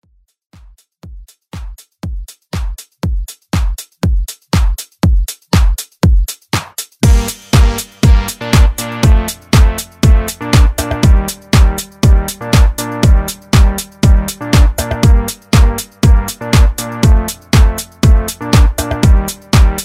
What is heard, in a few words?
Pop Redrum